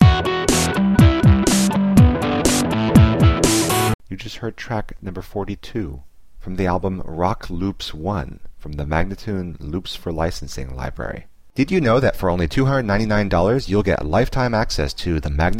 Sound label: 122 E, Rock Loops; Loops For Licensing